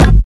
bumpin kick single hit g key, Royality free kick sound tuned to the G note.